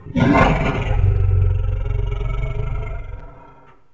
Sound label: dino sound